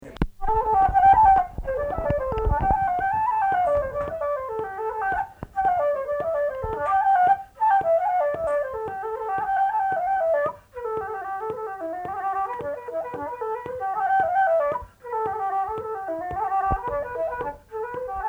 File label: flute